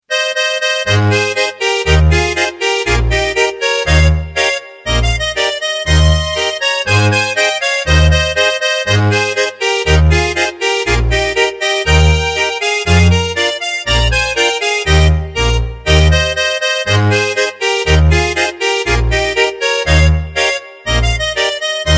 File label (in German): Sigriswiler Polka